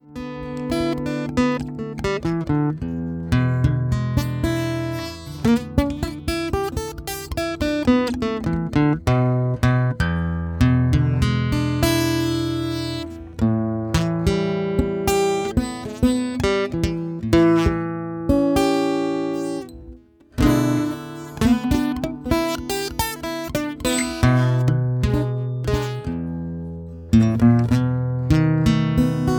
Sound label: Blues Guitar